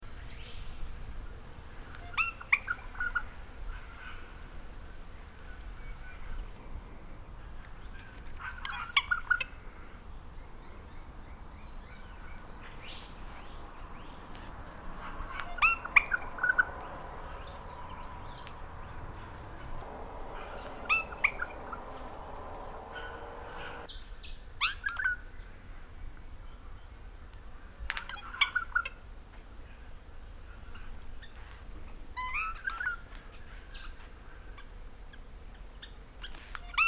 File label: Regent Honeyeater singing